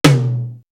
Tom, Drum One Shots

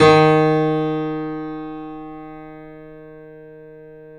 D#, The Piano; InVision Interactive - The Piano

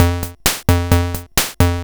Pop, Hing-Hon EK001

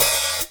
HAT OH, Hi-Hats; AMG; Now CD-ROM (Roland); NOW! Drums

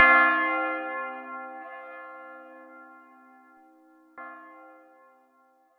Roland Juno D Perc Menu Kit

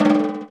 Folk Snare Flam; Snares

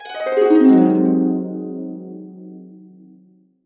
Harps; USB Soundscan vol.62; AKAI

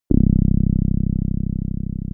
TB 303

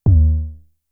Tom, Toms; Fricke Schlagzwerg; Drum Machines

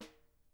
SN PP R, Percussion; Miroslav Vitous - Percussion & Harp

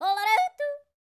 YODEL, World Voices; ILIO; Vocal Planet

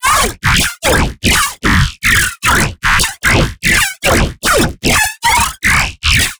Dubstep Atonal Pacer FX

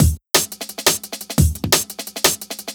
DNB BREAK; breaks; m8 breaks

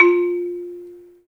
MARIMBA, F; 3D Sound; 3D Keys VOL-1

